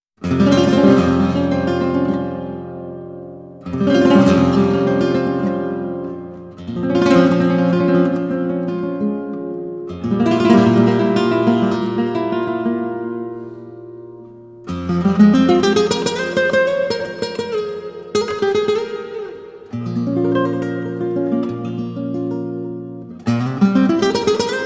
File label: Una Guitarra, spanish guitar